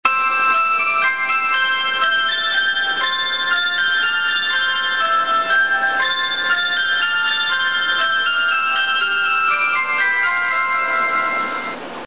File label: bus chime; 富士急バス